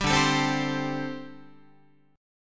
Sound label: D 7th Suspended 4th, down, Guitar